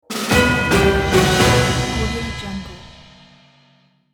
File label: dramatic orchestral breaking news logo